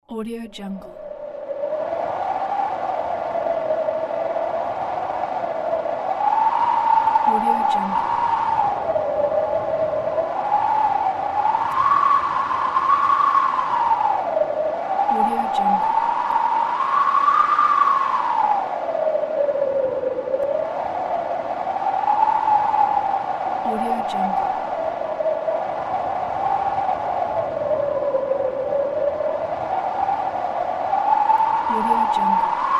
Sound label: desert wind